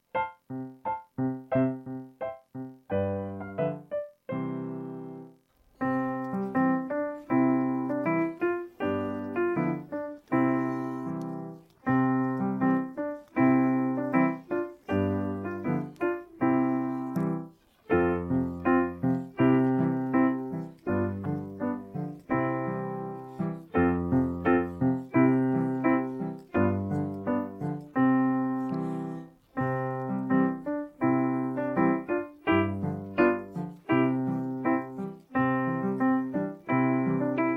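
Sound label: backing track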